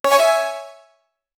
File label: choosed correct answer in, quiz